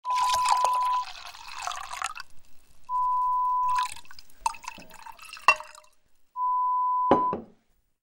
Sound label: Pouring Wine sound